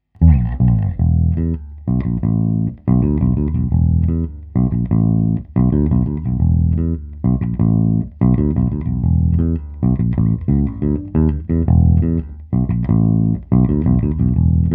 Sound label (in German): Tec Amp Taste Dry Picked; Tec Amp Sound Test